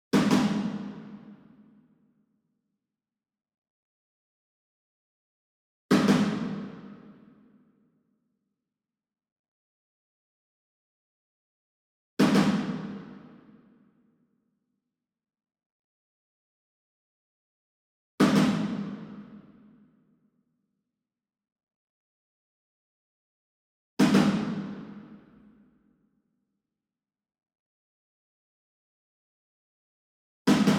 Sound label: SINGLE DRUM Shime Daiko Double Far, Cinematic Strikes; boom library; Cinematic Strikes Construction Kit